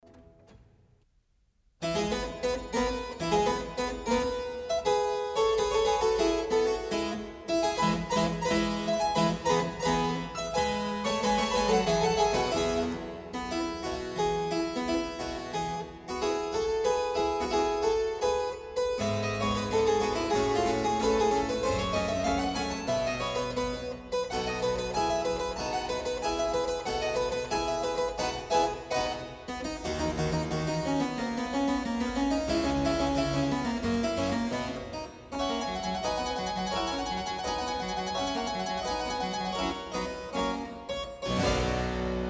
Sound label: sonata; burney